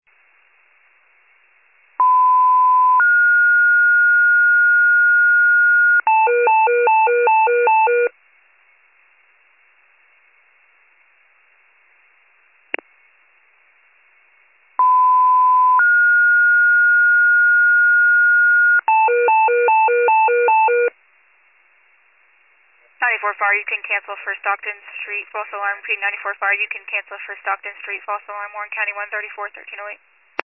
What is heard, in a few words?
FIRE pager alert